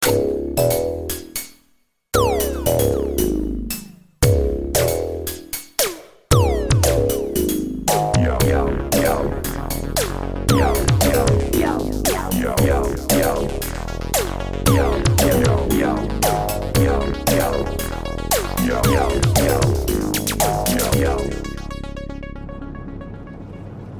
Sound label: regular electropop ringtone